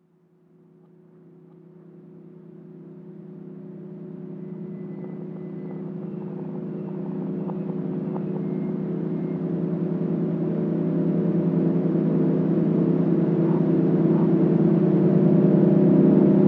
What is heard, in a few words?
Piano In Slow Motion